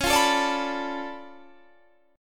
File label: D Minor Major 13th, C sharp